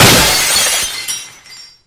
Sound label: Glass Shatter; Devour